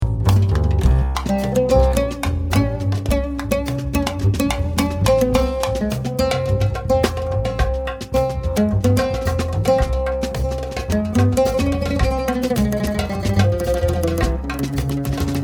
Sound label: Oud Solo